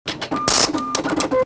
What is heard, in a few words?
store purchase success; Original TK Sounds